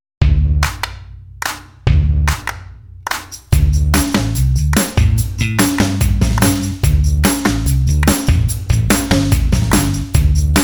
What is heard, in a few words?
No Whistling